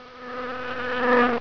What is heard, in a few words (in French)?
mouche; Reperages 94; tortue